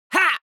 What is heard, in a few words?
cartoon vocal male fight shout short